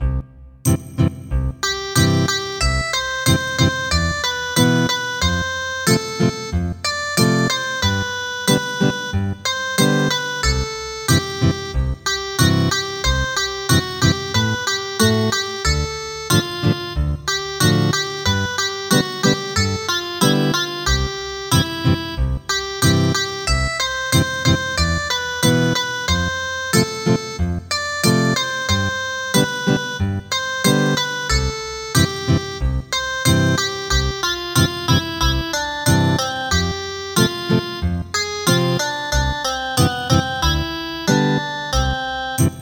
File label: Ethnic Guitar